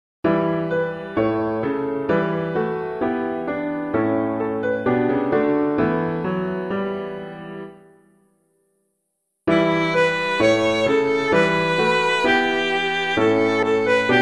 Now the Green Blade Rises from the Buried Grain, piano